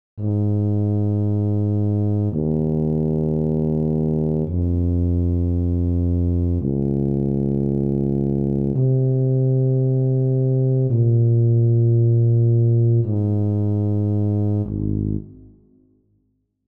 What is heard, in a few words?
Tuba; 吹奏楽; R6 吹奏楽部 卒部式